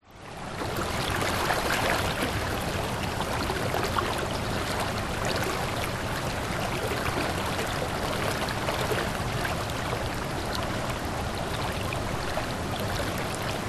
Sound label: nature creek water flow against rock waterfall in distance to right